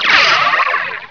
LASER

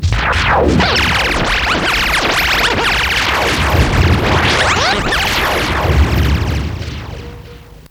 sun Shine Jingle; SunshineJingles

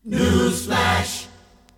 jinglepackage; topformat LP jinglepackage 2

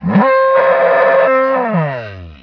vox dewback

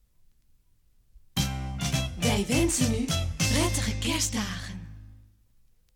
topformat maxi jingle single

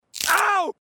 aaww; Simpsons; Homer

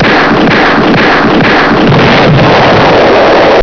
bang bang, Gunshots; sound effect

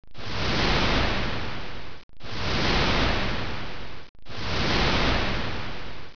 Ship, Water

